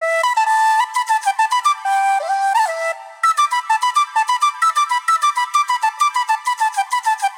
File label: Flute Loop BPM 130 E Min; Bihu; Uroniya; waves of bihu vol 2; Gully Loops